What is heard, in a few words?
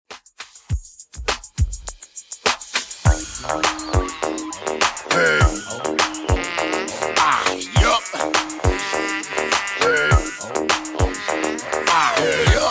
Redrum 102bpm